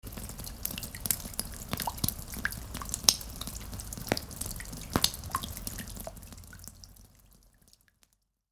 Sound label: Light Rain